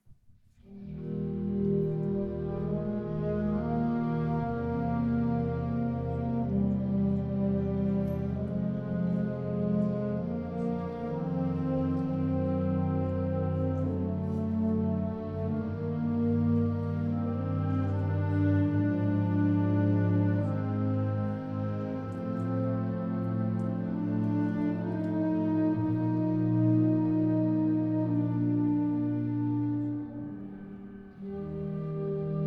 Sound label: Wind Symphony - Adagio; The Ocean Springs High School Wind Symphony